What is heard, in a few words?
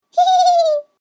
happy; Flashpoint; tobiidynavox; catchme